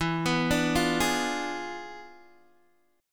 E 7th Flat 9th E down Guitar Open D

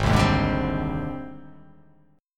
G sharp Major Sharp 11th, chord

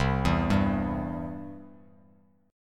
C Major; arpeggiated; JGuitar

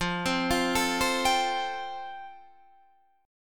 F Major Add 9th, Guitar Open D

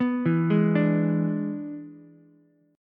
E 7th E down Ukulele; arpeggiated; chord; JGuitar

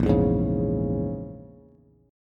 Bb Minor 7th Flat 5th, Bass; Bbm7b5 strummed

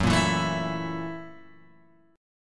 F sharp 7th Sharp 9th, Guitar, Open G

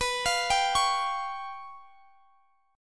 Db 7th Flat 5th

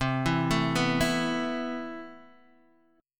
E Suspended 4th C; Esus/C arpeggiated

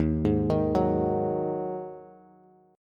Eb Minor Double Flat 5th Eb Bass; JGuitar

